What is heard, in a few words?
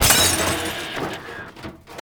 Destroy Television